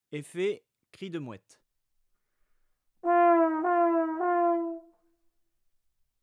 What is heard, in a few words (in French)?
effet cri de mouettes, trombone; Effets; Glissades; traite orchestration; trombone tenor trombone basse